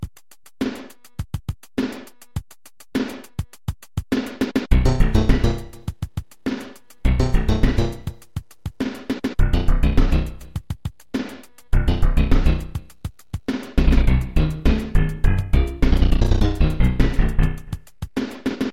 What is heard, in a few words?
Underground; SMB3; Super Mario Bros. X